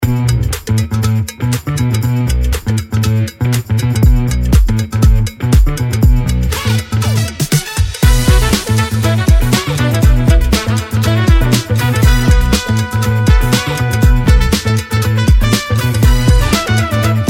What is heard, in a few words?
Funky Funk